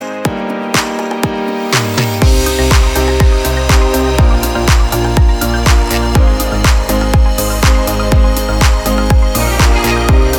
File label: Instrumental